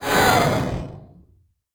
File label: nightmare death; Crypt of the NecroDancer